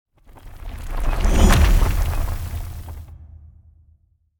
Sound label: Stone Door Shuted